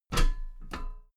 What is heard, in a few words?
step metal ladder step top regular